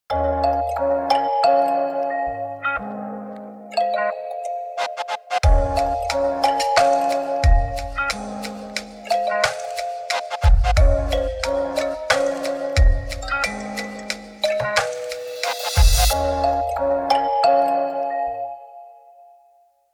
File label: Glassy Bell; Samsung; Galaxy A03 Core; ringtones